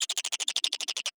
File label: Animal Mammal Herbivore Chipmunk Chatter; animals; AdobeCC